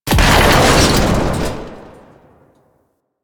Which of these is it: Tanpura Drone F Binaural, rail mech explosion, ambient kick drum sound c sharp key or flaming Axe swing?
rail mech explosion